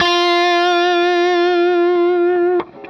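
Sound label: fuzz guitar vibrato; FUZZGTR1 VIB; Sonic Reality; Vintage Timetraveler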